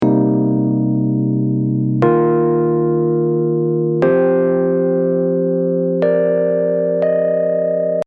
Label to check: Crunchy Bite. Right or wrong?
Wrong